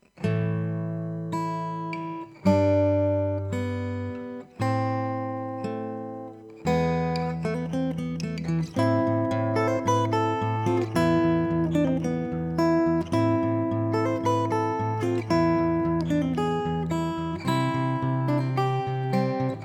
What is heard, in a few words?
title theme (solo guitar); deus ex